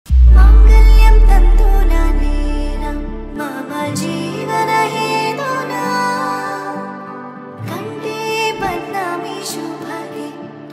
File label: wedding music